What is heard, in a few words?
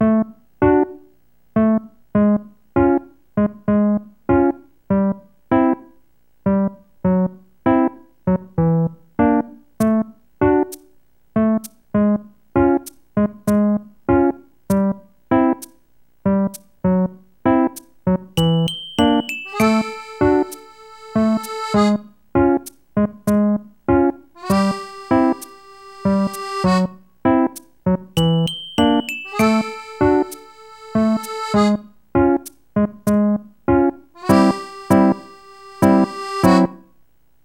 6 AM (Rain); animal crossing let's go to the city